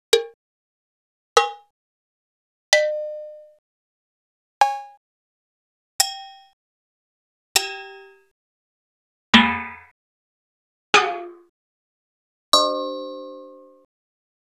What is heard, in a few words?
R8 Mixed Perc; X-Static Goldmine